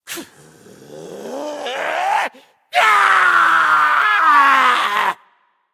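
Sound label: non-verbal battlecry; smite; voicepack; jingwei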